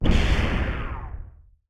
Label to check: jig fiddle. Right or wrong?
Wrong